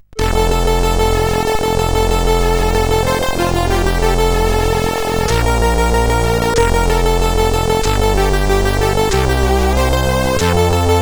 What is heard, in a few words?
Afrika Intro, CSG8580R5